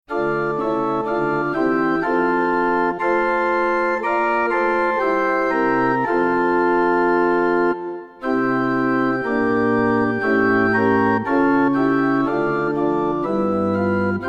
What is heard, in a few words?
Pipe Organ